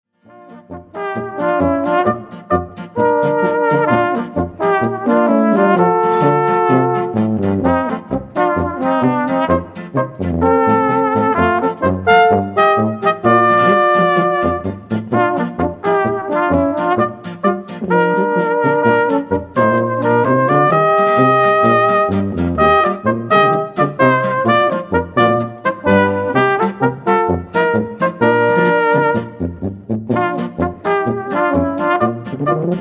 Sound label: Polka